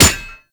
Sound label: Bayonet Melee Quick Impact; Warframe; Tenno